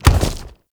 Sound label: Arm; Warframe; ManInTheWall